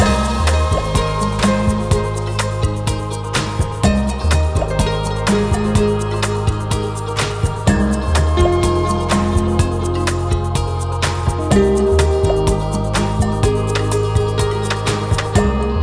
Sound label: Musical Rock - Benthic; zoo tycoon 2